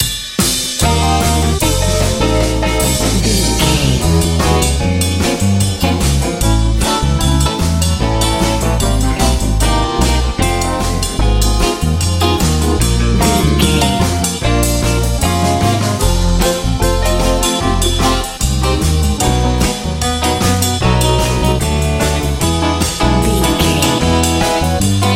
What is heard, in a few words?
la groove